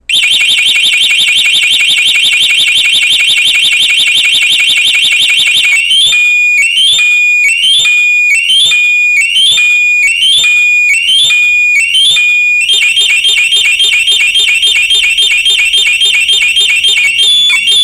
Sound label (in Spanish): alarma x28 M20 disparo; alarmas